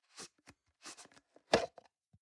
plastic can small lid screw open soft

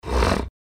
wheeze

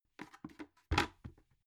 bucket lift up put down soft

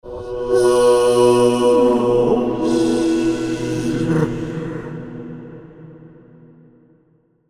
like monks

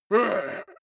male shout hit soft

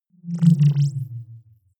wobble drop splutter low

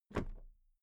toolbox close drop lid very soft

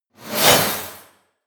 scrape

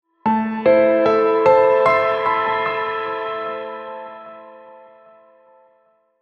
piano dream

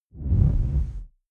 noise buzz

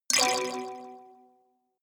notification kalimba delay slide minor

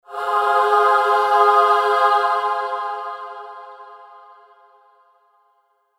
choir pad

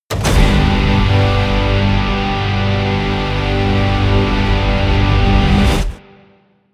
promo pad

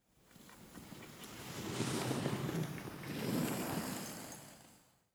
skiing passing and stopping

